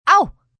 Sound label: Pain Short Ow, Pain, female, short